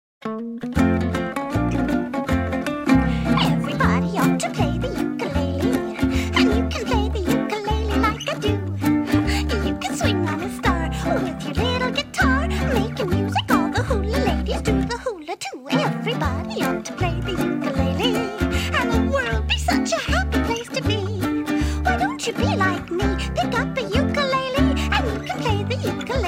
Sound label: Ukulele Song; Disney; Walt Disney Collection